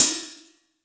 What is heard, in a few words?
hat; Quasimidi Technox